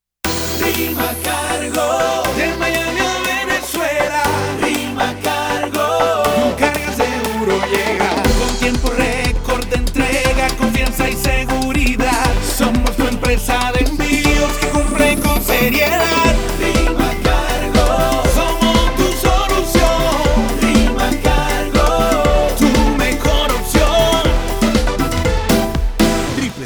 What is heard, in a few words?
Jingle; Rimacargo